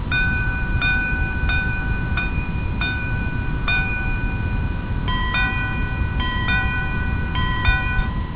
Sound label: watch